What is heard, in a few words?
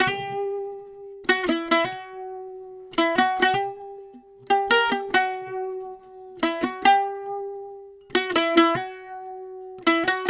Eastern Mandolin 140bpm E